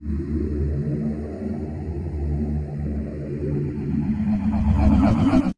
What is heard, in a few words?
DRONEY, Trance Fusion, STOP SHORT; ILIO